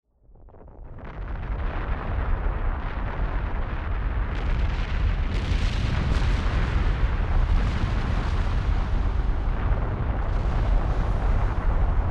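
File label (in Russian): grohot vulkana, volcano rumble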